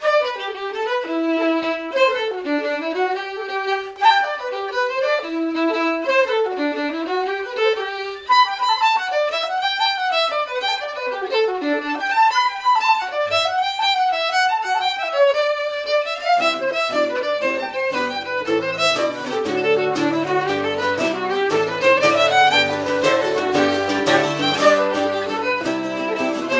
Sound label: Orchestra Ambience; Assassin's Creed III